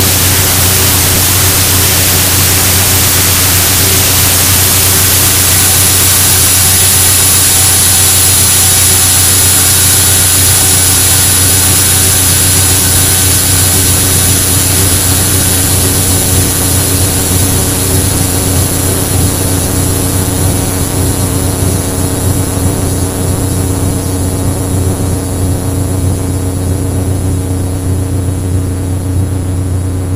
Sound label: hymn hum